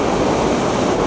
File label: Blow Torch; worms